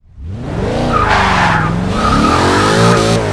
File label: first, vehicles; gmod; tdmcars; jag ftype